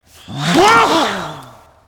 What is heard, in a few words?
Ghost Attack; Character Ghost Attack1